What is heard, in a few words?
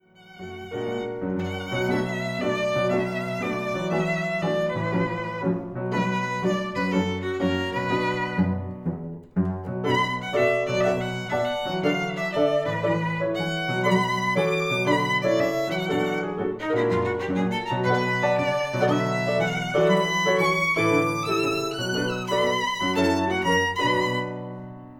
piano trio